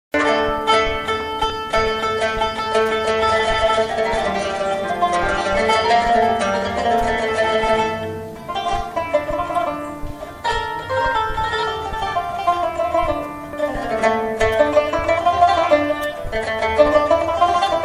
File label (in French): luth